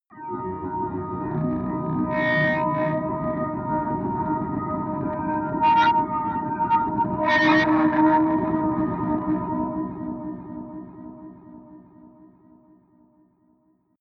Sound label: atmospheric feedback distortion; musikelemente